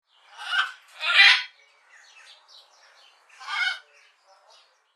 parrot sounds

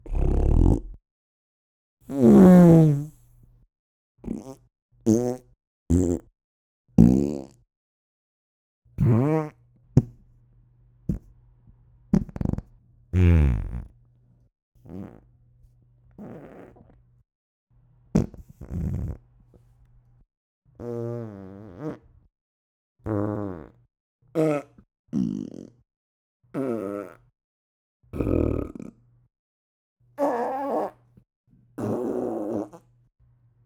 fart sounds

